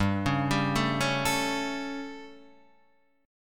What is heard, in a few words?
G Suspended 2nd Flat 5th G Guitar Standard; Gsus2b5 arpeggiated; JGuitar